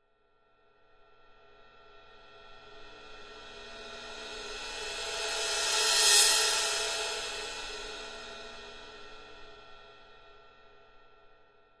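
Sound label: Cymbal Thin Crescendo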